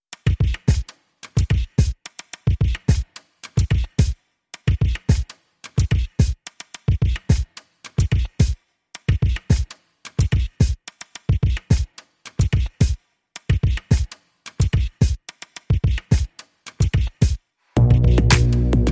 Drums and Bass Mix

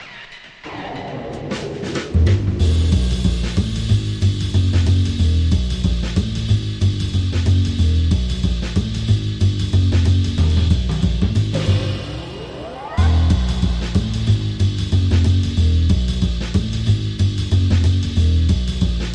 Lounge Lizard, Drums Bass and SFX

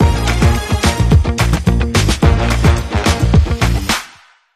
Swing

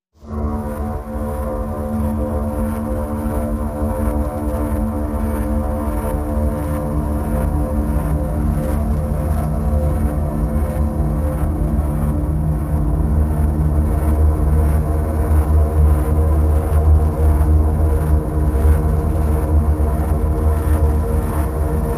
Bed Mix

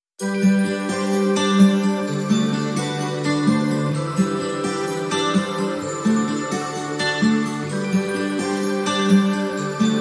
No Perc

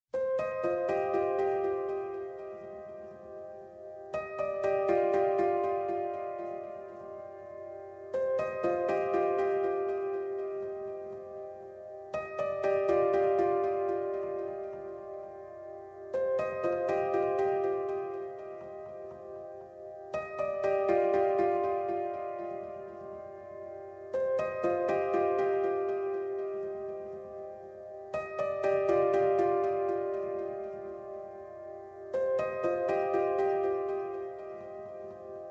High Piano Only

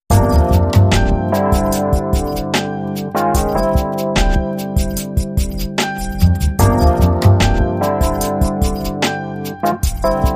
Guitar Chill, Underscore

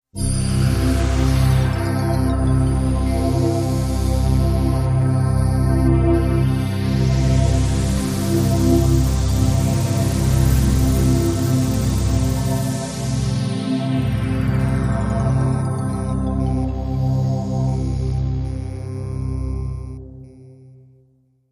Dronus Maximus

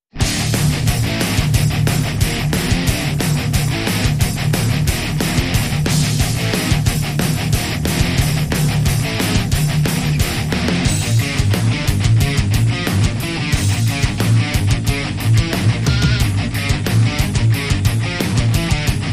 Thrash Metal Theme

